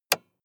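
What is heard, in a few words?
sports car interior switch on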